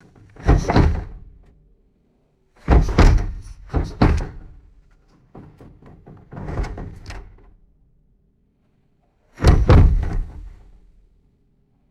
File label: wooden desk bumping against a door; doors